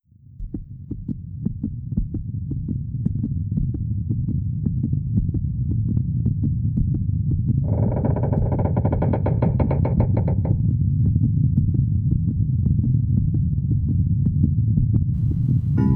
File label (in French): Suspens; les emotions du cinema en musique